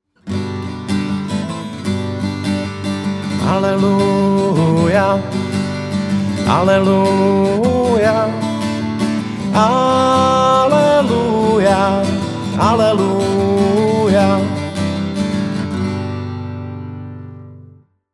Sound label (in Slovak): Aleluja, G dur